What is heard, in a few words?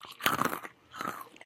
Eat